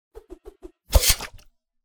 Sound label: spear, weapon attacks; psfx